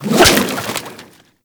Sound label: Kraken Tentacle Whoosh; Pirate; Warframe